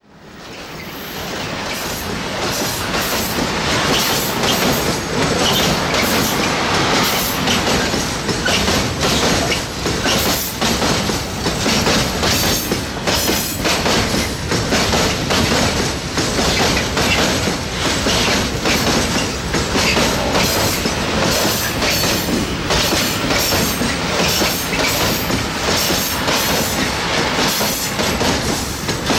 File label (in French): bruit joints de rails; sonotheque